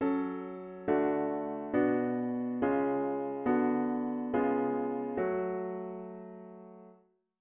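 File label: diatonic inverted V7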